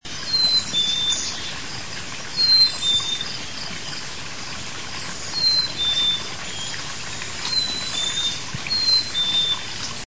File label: Black Capped Chickadee pheer pheer